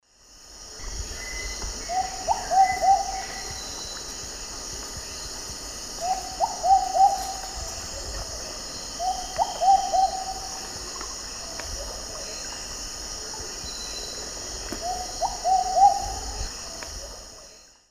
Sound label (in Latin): Patagioenas subvinacea, song; S. America; Eastern Lowlands